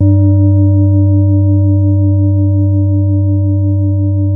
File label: BOWL, Tibetan Bowls